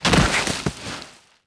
bodyfall grass, foley

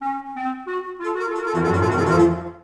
Tension

